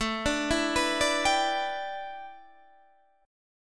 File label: A 7th Suspended 2nd Suspended 4th Guitar Standard; A7sus2sus4 arpeggiated